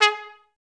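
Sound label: staccato G#3; Best Service; Peter Siedlaczek's Advanced Orchestra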